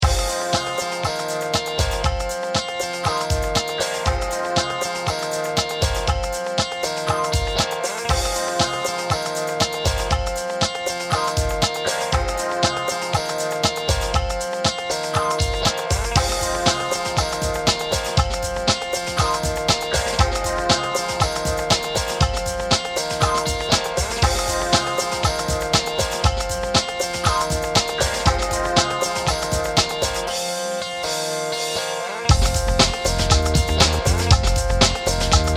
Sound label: San Fran Disco